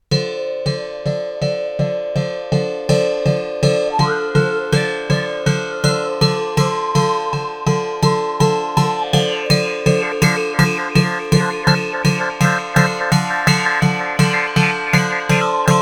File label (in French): 2 cordes classic, guimbardes; comicbow